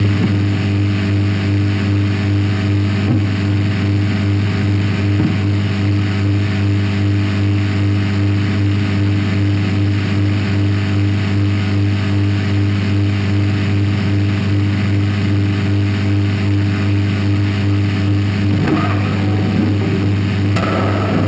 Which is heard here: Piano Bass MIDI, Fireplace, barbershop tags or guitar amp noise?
guitar amp noise